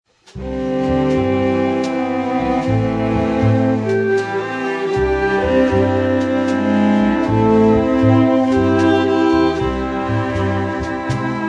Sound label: String Quartet